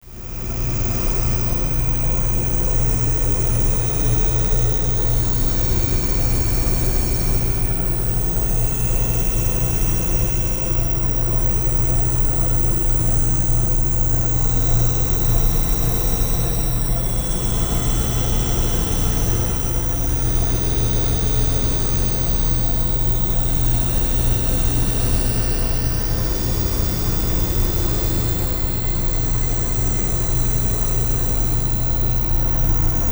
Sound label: incubator